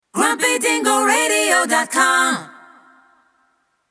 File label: Radio Jingle Acapella; Grumpy Dingo